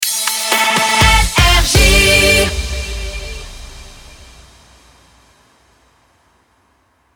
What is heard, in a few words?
Jingle Rapid Fire; NRJ